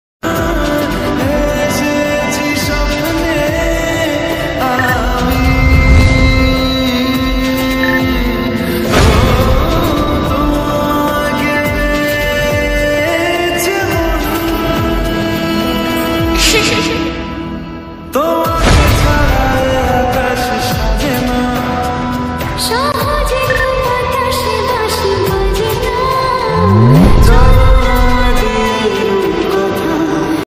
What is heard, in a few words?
lofi bengali ringtone